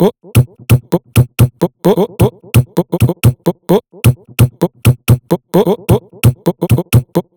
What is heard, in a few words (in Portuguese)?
BEAT BOX